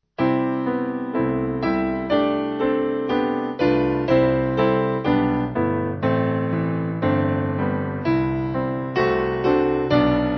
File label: Piano